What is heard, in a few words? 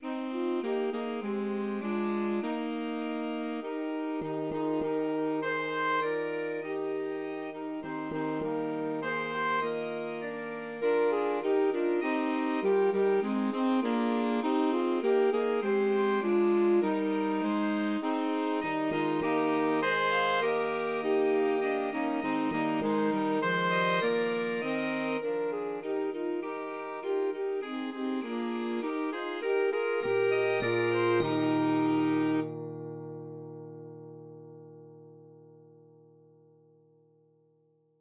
Saxophone Track with Melody; The Holly and the Ivy